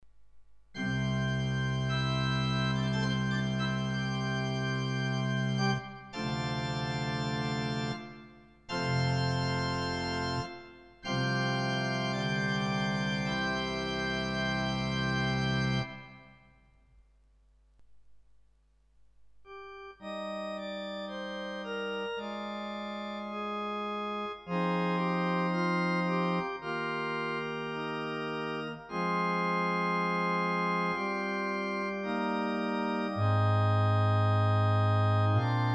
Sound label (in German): Orgel; Coda Pro